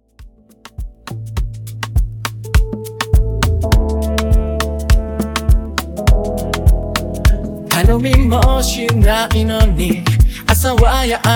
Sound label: Afro Beat Mash Up